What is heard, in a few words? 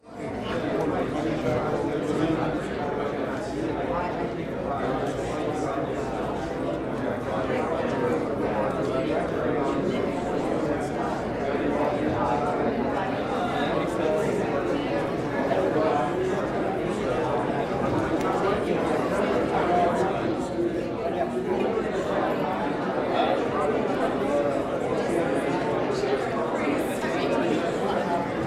Crowd talking in public